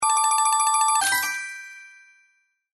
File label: collect coins